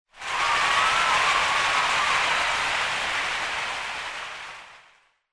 Cheer Crowd, High Mid

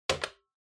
Flip Clock Button Off Strong

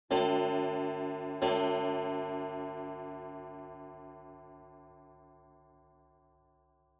Pendulum Clock Chime Denoise Mid

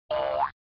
OS Sound Notification

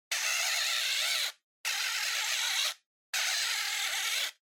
Outlet Timer Dial; コンセントタイマー02-04(ダイアル); タイマー機器

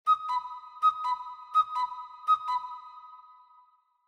Cuckoo Clock (Reverb-Long)

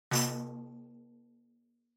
Tambourine (Rim Open)

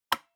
Music Box Foley Switch

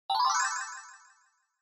Scene Change Delay Fast Short

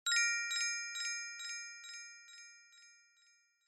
Glocken Low Delay

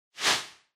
Noise Sweep Dry

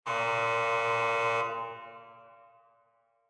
Electromagnetic Buzzer Hall

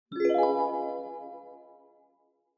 Multi Accent High

